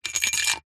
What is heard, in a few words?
foley small stone in small metal tube tilt slide; zapsplat